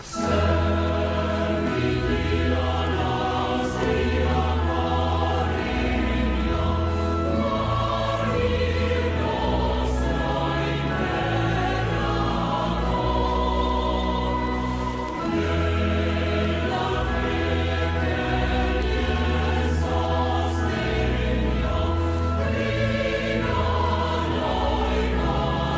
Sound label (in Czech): hymna italsky